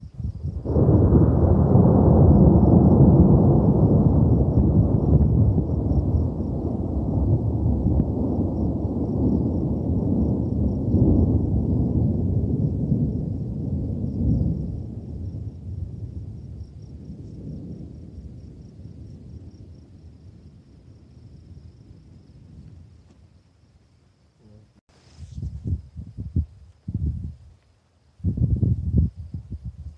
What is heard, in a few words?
Thunderstorm